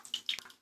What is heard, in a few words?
Drip; don t be afraid the first toy